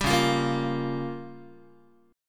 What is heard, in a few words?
C sharp 6th Flat 5th C sharp Guitar; strummed; 4A,4E,5A,5D,5G#,6C#; C#6b5; 10,9,8,8,9,x; JGuitar